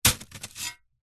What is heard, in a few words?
industrial shovel space metal single dig into gravel stones; zapsplat